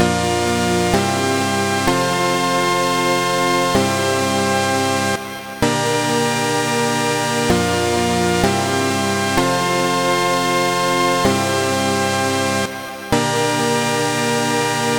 melody scale; EDM; Ableton Live MIDI effects

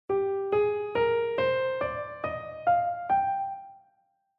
G Phrygian mode; key signature

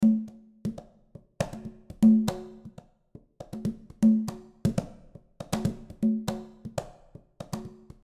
basic Perc velo; Ableton Live; percussion

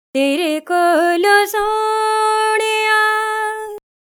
DI Dry

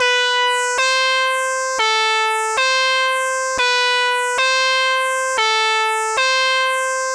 Chords; arpeggio pattern; Reason

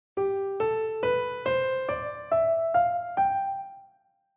G Mixolydian mode; key signature

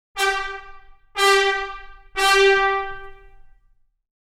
Keyswitch, cinebrass

